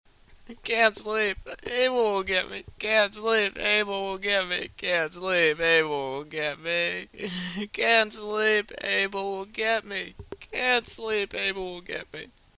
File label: whimper